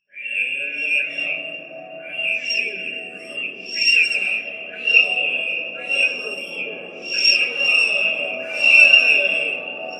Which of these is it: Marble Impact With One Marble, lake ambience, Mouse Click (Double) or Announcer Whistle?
Announcer Whistle